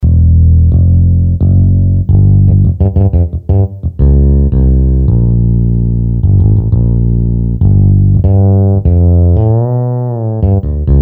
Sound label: Finger Bass; Yamaha PSR-550; Voice